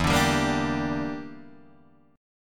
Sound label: E Minor 7th Flat 5th E, Guitar Drop D